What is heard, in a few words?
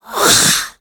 NPC Hit